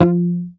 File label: Bass, MUTES